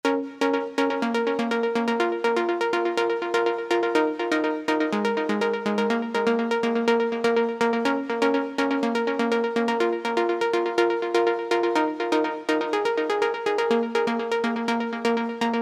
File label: melo serum; Serum Vital